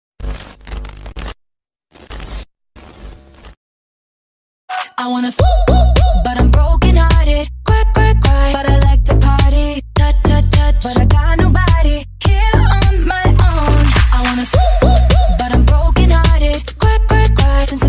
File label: ringtone glitch